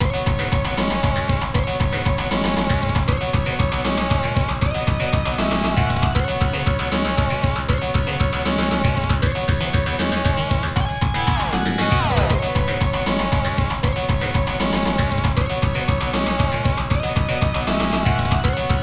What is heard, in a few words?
Enemy Approaching; Cosmic Psycho; X68000